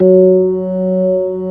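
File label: ORG B3 F#3, organ; Best Service Gigapack 2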